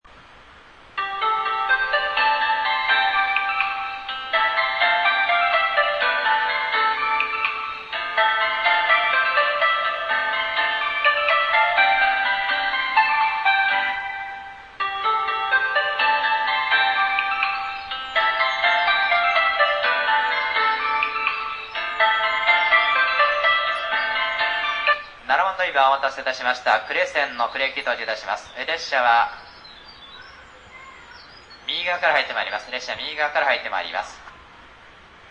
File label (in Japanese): hiroshima nyusen, ekihousou; 7番のりば入線メロディー; sanyo-line; JR西日本-広島駅